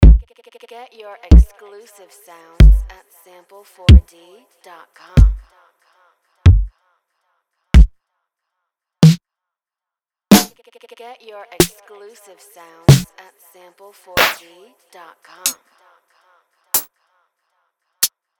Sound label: SP1200 Dirty Drums loops; sample4d